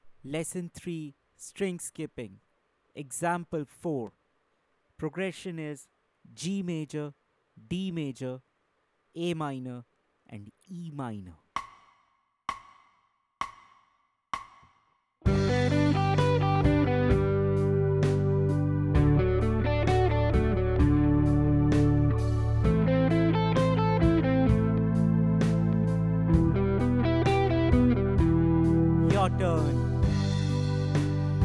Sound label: String Skipping Playthrough Slow Speed, lead guitar; string skipping arpeggios; The Art of Lead Guitar